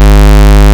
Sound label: OB SQ, Synths; Ableton Live 12 Lite; Core Library